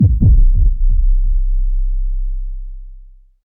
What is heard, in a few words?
shot